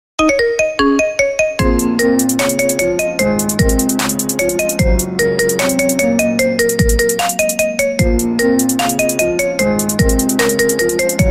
marimba remix Рингтон для iphone

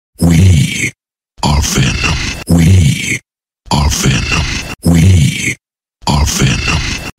iPhone 6s Notification

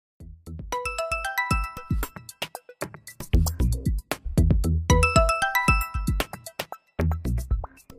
Radio Vivo Ringtone

